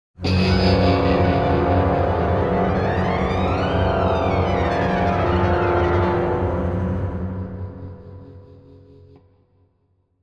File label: Stinger Bad